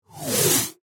Whoosh Sci Fi, Whoosh, Scifi, Pressure, Release, Production Element, Imaging Element, Accent, Transition; BlastWave